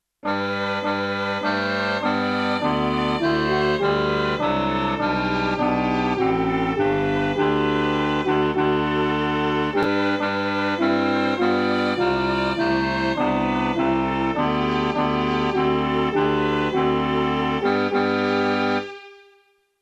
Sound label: Joyful joyful we adore thee